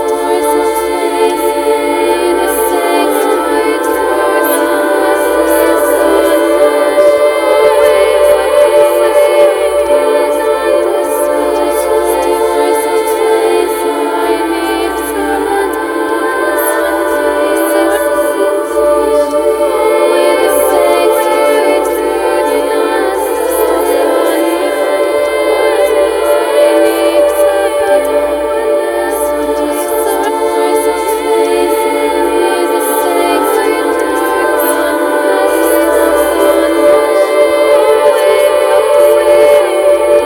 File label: Succubi Choir